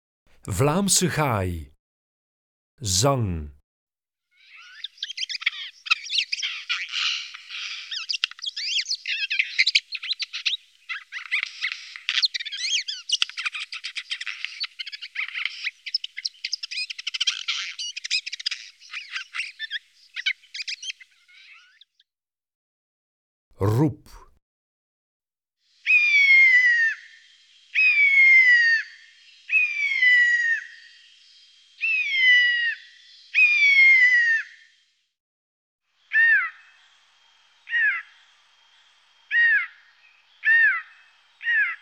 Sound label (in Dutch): Vlaamse gaai, Zangvogels; IVN